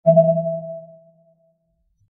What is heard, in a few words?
abstract sound